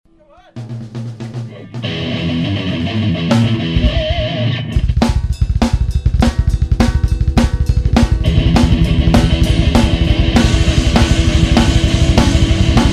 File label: Death Metal